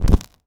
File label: sparkle